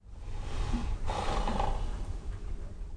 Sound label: Big Sigh; model railroads